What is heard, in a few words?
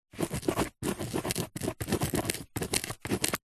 office pen biro ball point write on cardboard piece